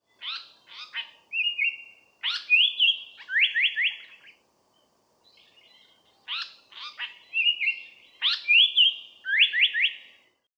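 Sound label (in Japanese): sankouchou song, paradise flycatcher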